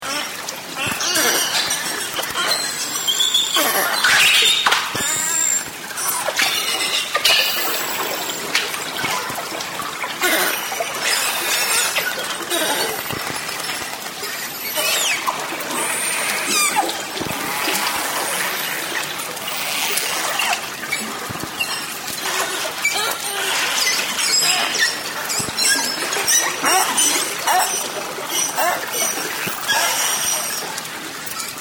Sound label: beluga